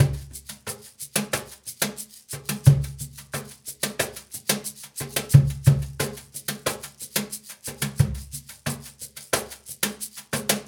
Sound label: Cajun, Percussion, Drums; Essential Percussion